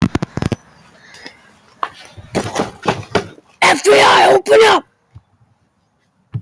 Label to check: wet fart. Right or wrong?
Right